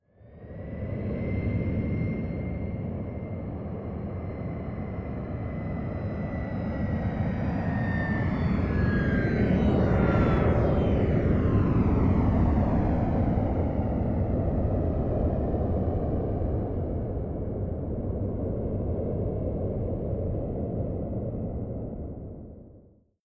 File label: plane boeing 737 flyby distant front